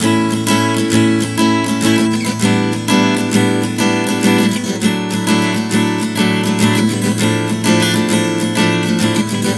Progression 100 A-G-D-Dm, Acoustic Guitar, Chord Progressions 100 bpm